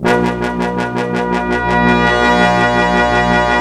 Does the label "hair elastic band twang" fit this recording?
No